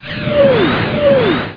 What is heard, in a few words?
warp rocket; DR BRAIN; BUGS